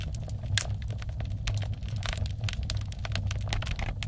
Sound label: fireplace; Adventures in Lestoria